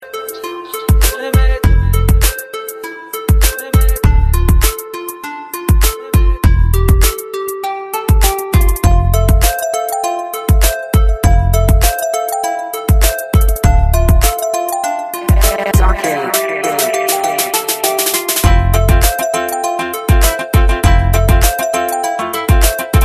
emptiness instrument; hip hop

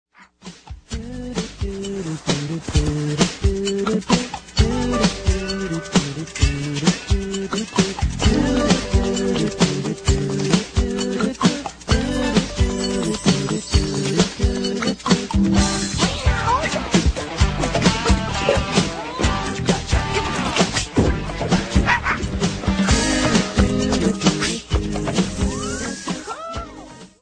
theme song; nickelodeon; doug

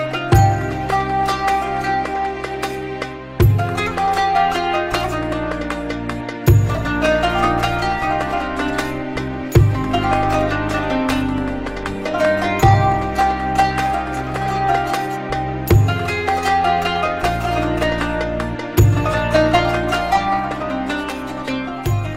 Pakistan Music

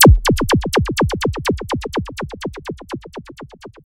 synth fx

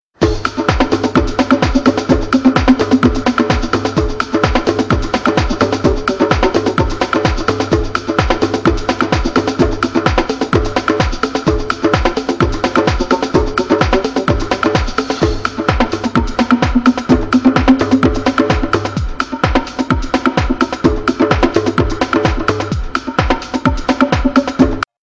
Dance Room; N72

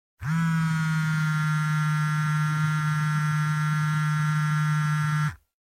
phone vibrate

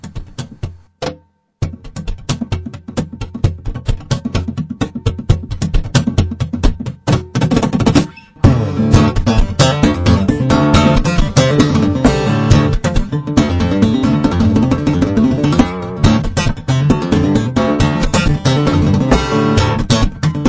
nice guitar

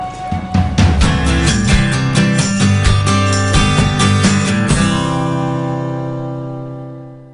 greek tv show

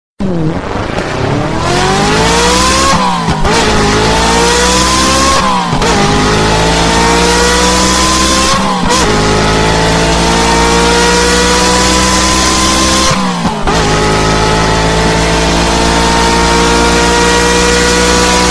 awesum ferrari turbo; ringtones; rock

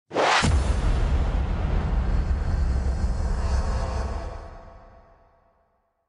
jet crash sms; ringtones

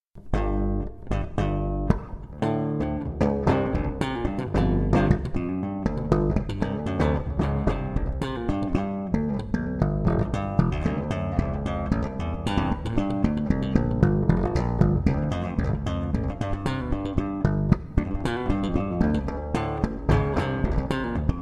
bass slap; ringtones

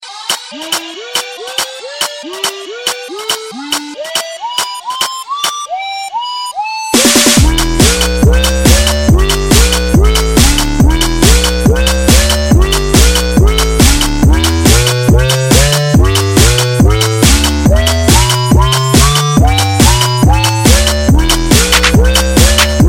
Bubble Beats

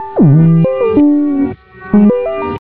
Bassline Atonal

